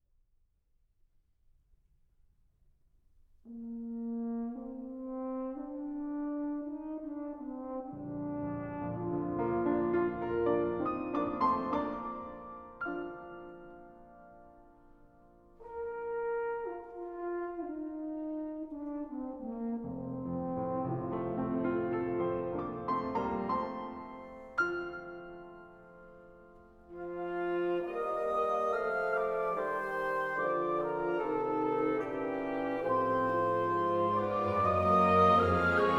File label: Piano Concerto No. 2 in B flat major, Allegro non troppo; Op. 83; Johannes Brahms